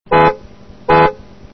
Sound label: horn